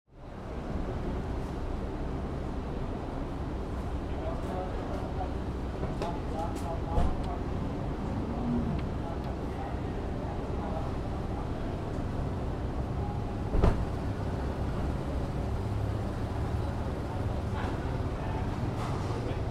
Airport hall quiet ambience

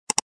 Old mouse click

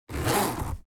Purse zipper noise open close

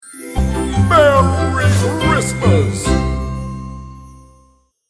Magical merry christmas festive celebration

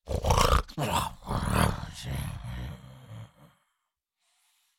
Cartoon lion voice snore snoring

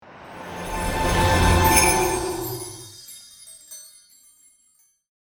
Christmas whoosh transition

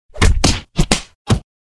Heavy punch combo

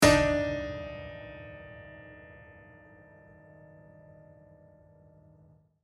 Haunted piano string hit horror

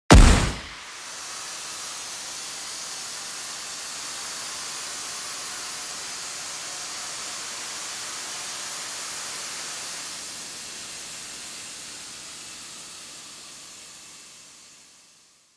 Smoke grenade explosion air hiss

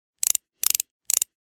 Ratchet wrench turning three times; gfxsounds

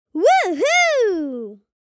Woo hoo cheering boy child kid

